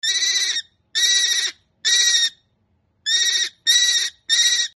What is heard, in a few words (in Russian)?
krik sokola